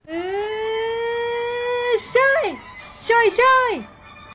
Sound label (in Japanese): suzu